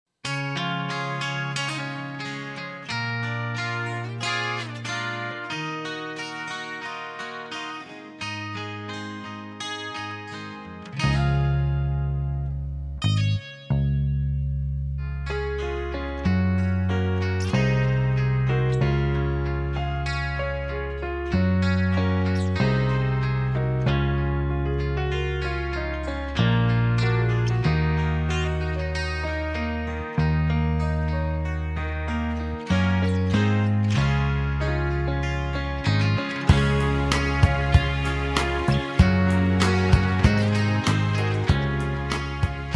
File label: (Db) No Backing Vocals